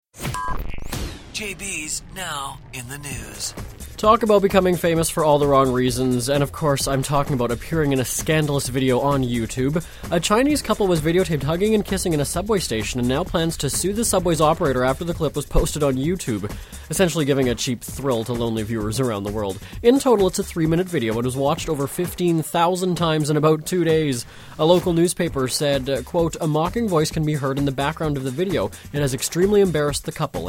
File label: kiss